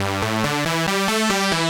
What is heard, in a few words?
Lead 140; Audacity; loops